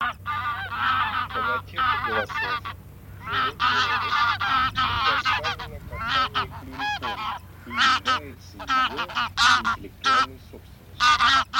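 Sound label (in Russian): Гусь серый базар Oche Selvatiche, манок; chip mini gusiniy 5B plurifon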